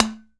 SIDE SLAP, UDU; AKAI S6000 CD-ROM - Volume 5

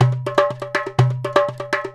Tablah, Roland BK-5; Loops & Phrases

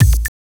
KICK RHY 120 BP; Rarefaction; Poke in The Ear With A Sharp Stick

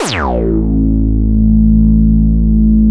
OB VOX A2

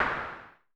SN Filtered, Vermona Mono15 Drums Snare

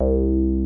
TB303; Zero G; Man Machine

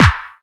KIT, Dance Mega Drums; Best Service

